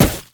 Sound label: infected cleaver; mundo